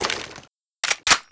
SR25 select; csof

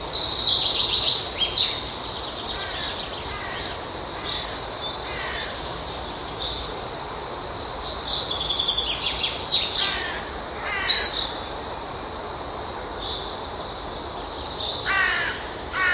bird ambience; alsace

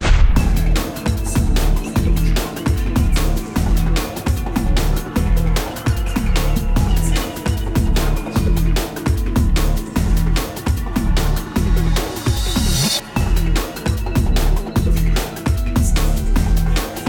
hero theme, music

